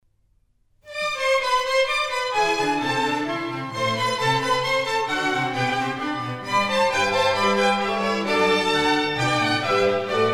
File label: Minuets, G major; Mozart; Dances and Marches